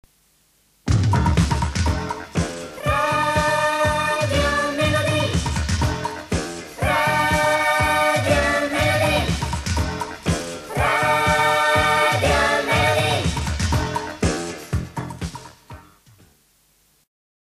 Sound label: radio melody